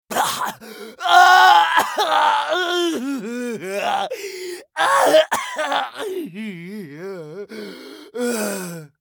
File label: scav death; eft